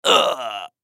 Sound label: Human Grunt; Grunt Long Element Male 25 Yrs; Blastwave